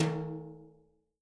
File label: BRUSHES, Living Drums; Sampleheads; Peter Erskine Living Drums